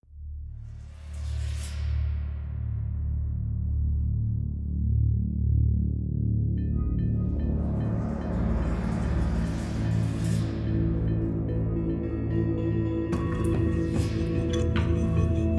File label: loop Demons